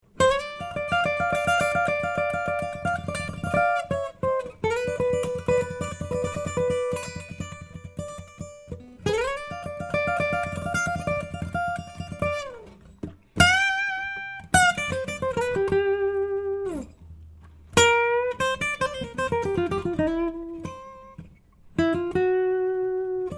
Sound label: Solo